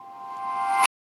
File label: musicbox